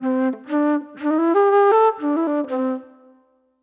Soul Plate Flute

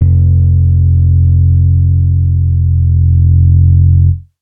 Dusty Analog Bass F, Bass Guitar

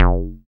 Bass SH-101

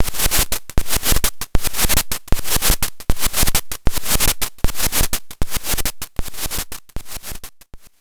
VINYL, FX, Analog; USB Soundscan vol.49; Analog Fantasies; AKAI

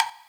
Wood, Block Stick; Equipped Music; Loungin House

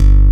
PHAT GTR BASS, Synthsonic; Pro-Rec - Synthsonic; BASSES

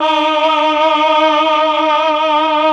CHOR, Propeller Island Legendary M400

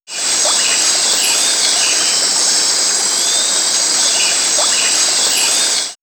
Nature, In D Jungle; X-Static Goldmine

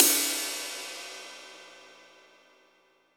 RIDE, MONO RIDE CYMBAL

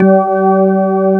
CX3 C4, hammonds; East West; Global Sounds

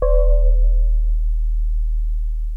Electric Pianos; Wizoo Electric Pianos

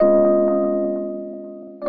RHOD, Houseworx; Ueberschall

